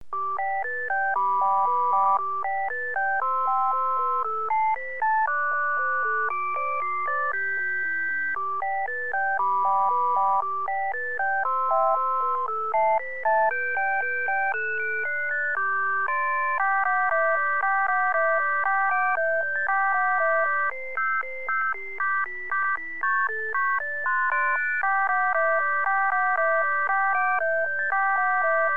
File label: Jingle Bells, car horns